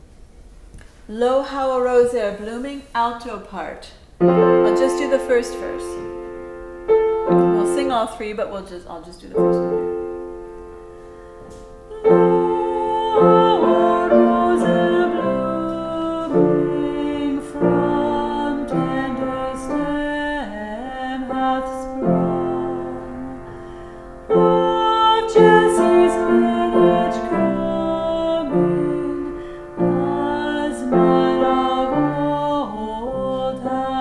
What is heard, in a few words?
alto; Lo How a Rose